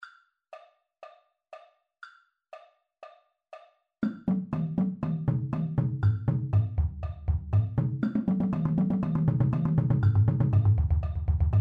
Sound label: 8 On A Hand 120 bpm Bass Drum